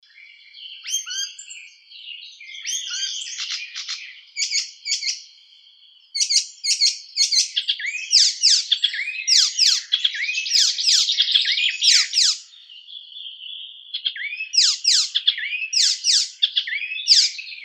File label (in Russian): peresmeshka zelenaya hippolais icterina